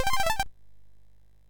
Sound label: C64 BGM; Rock 'n Bolt